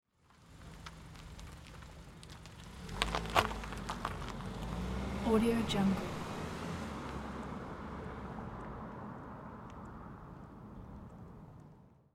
car driving away slowly

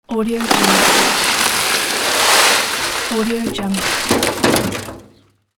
sand pour

